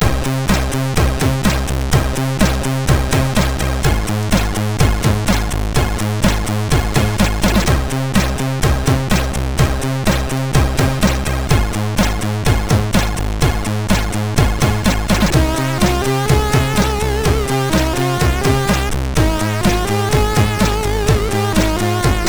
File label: sid MOS6581R3; Important Information